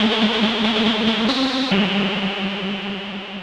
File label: TECHNO SYNTH 140; Keys